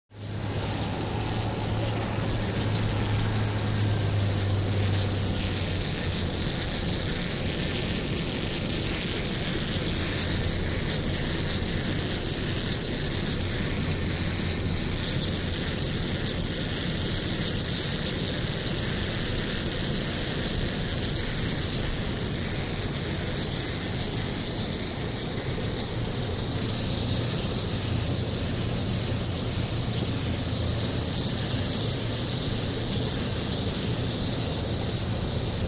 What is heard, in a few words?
Ski Chair Lift 2 Cable, ski lift motor